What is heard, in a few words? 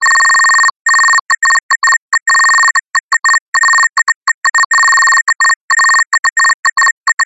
science fiction code incoming beeps